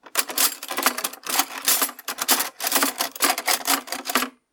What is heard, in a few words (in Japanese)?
katyakatya